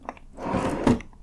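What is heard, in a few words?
hotel drawer open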